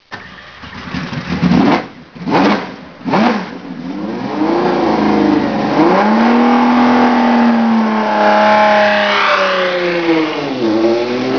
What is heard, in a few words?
Austin Healey Sprite Le Mans Prototype; Austin Healey Sprite Le Mans Prototype (1960); Ausfahrt; aus der Boxengasse